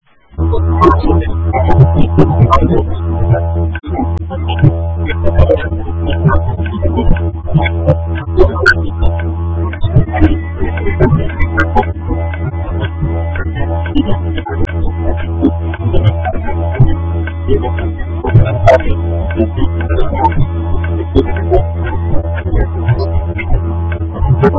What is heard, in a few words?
didgeridoo